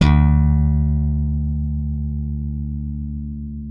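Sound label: left thumb D2, slap thumb; Xsample VOL-1; 90 s Sample CDs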